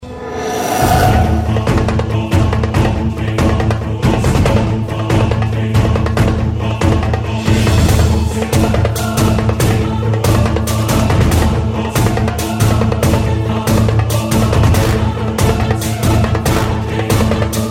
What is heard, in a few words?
Ingame Military Attack